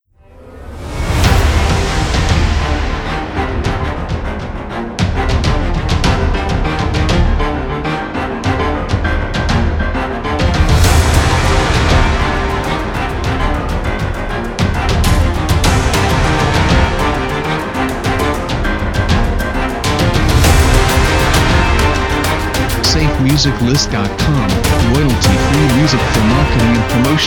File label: Epic Apocalyptic Trailer; SafeMusicList